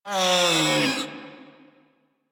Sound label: Feral Vocal Death; Warframe; Catbrow